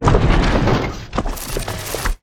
space platform tile build, factorio sound terrain

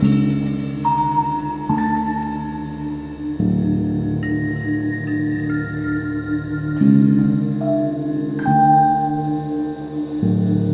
Suspense, music

